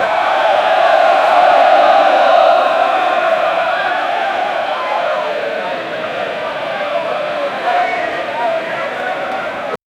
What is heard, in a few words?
clapping